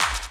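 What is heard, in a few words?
snare drum bass drum, Dance Mega Drums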